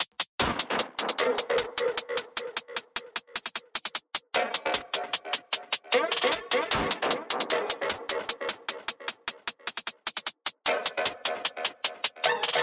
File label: tops 152, Drum Sample Pack Loops Top Loops; SD SEISMIC; Slate